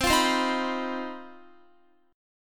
C Minor Major 7th Double Flat 5th chord, Guitar; CmM7bb5; strummed

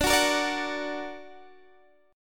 A sharp Augmented

